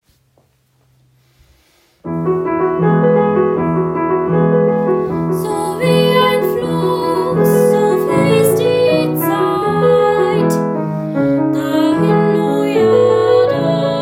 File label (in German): Vocals und Klavier; Anno Schreier